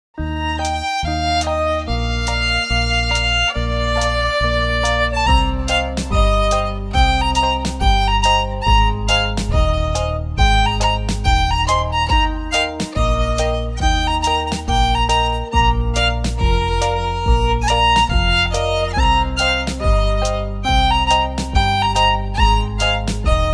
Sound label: violin